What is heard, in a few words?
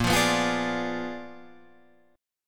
A sharp 7th Flat 9th A sharp down Guitar Drop D